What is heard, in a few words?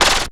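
snare drum FX, Drum & Bass; Ueberschall; Drum & Bass Resonance; 168 BPM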